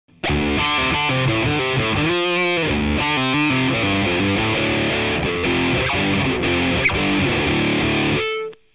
Audio-Technica AT Pro37 Gain (Bridge)

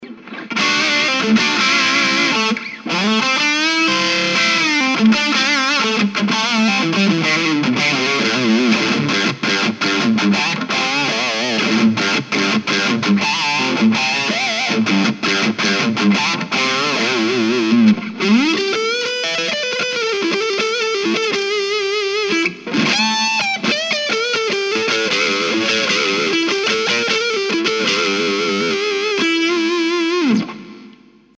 reference tubeman lead

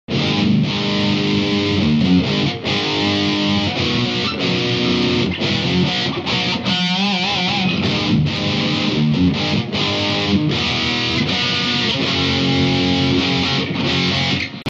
tubeman

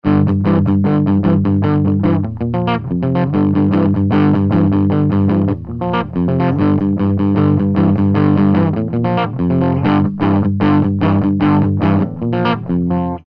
marshall min g rhythm, guitar

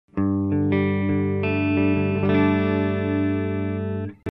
Audio-Technica AE3000 Clean (Neck), guitar

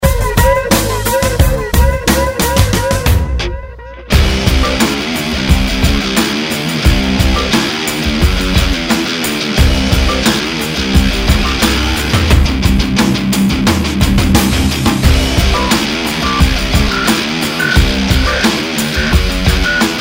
reference tubeman mix

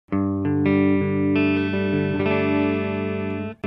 Behringer XM8500 Clean (Neck), guitar

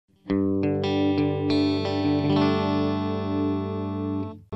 Audio-Technica AE6100 Clean (Bridge Neck), guitar

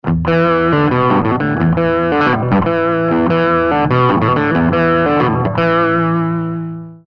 marshall low g f riff

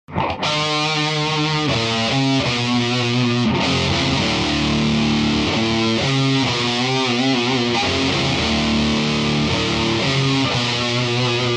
badcat double gain

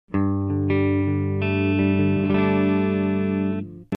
C1 Clean (Neck), guitar

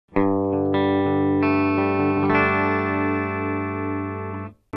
Audio-Technica AT4040 Clean (Bridge), guitar

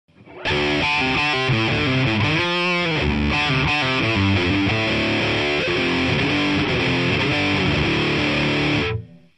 Behringer XM8500 - Gain (Bridge)

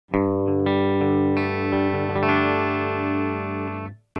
Behringer XM8500 Clean (Bridge), guitar

